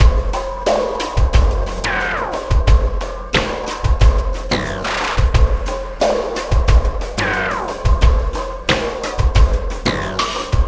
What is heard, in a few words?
Acoustic Drumloop; Drums & Percussion